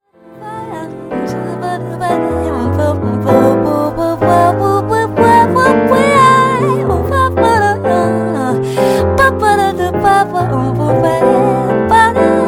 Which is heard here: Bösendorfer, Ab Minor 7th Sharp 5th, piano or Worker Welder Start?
piano